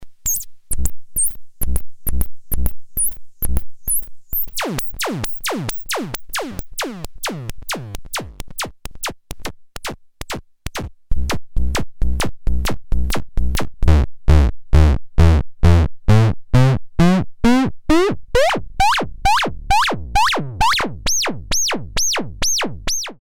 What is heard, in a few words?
Landscape Noon